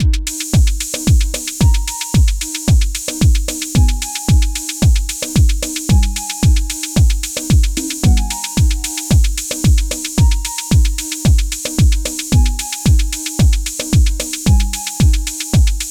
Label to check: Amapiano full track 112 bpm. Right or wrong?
Right